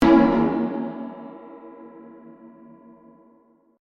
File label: Piano hit